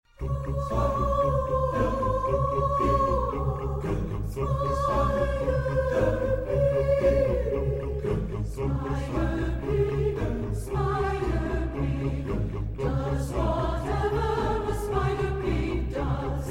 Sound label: Song